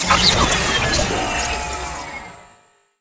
Reboot Power Down; AI Stalker; Titanfall 2

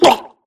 grunt death neck; Titanfall 2

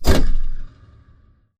sliding door ext verb open stop; Titanfall 2

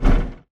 bt footstep jog parts; Titanfall 2

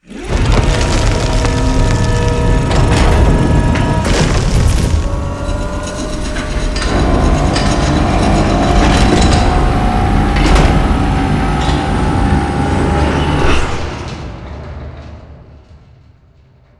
Rear Building Rise Up; Boomtown; Titanfall 2

